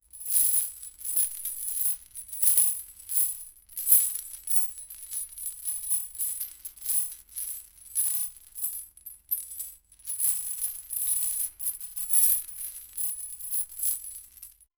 golden chains sound

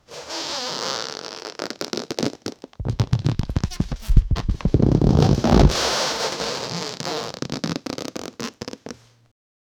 loud flapping of balloon

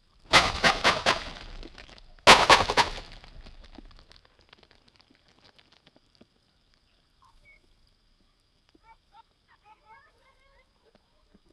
bear animal jumping then

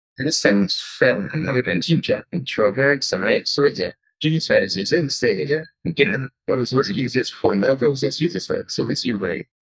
sound track of a quiz, game show

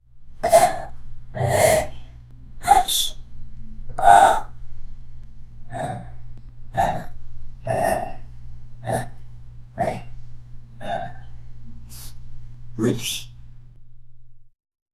on completing something, achievement

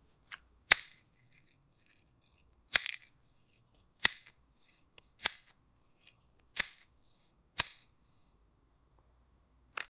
the sound of a knife, fruit

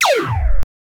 laser gun shot for space

